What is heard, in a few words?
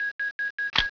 reload in, weapons; tfa cso